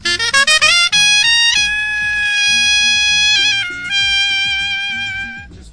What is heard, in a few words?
on sax; MELODIC SAMPLES; HORNS SAX TRUMPET BRASS